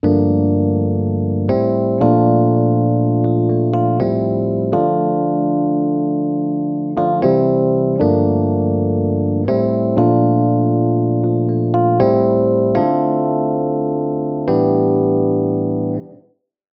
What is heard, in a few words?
e piano mix